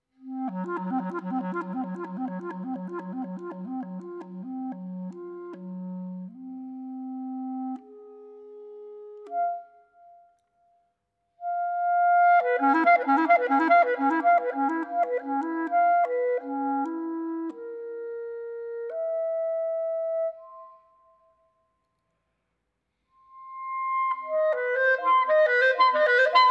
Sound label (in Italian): Clarinetto